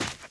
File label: gravel, footsteps, run